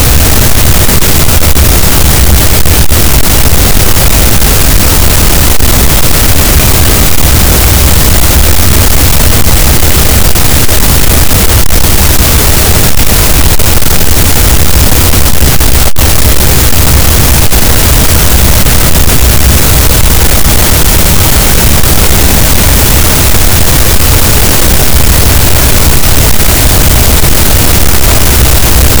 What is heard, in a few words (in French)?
cachalot, Physeter Macrocephalus, gaussian noise